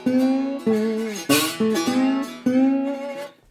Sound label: Blues Guitar Samples; ILIO - Fretworks